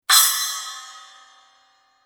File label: splash, cymbal; sabian